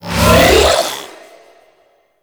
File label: Paladin Cast; Warframe